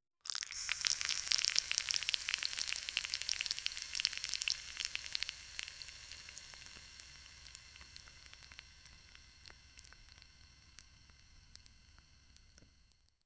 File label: fantasy cooking sound, bubbling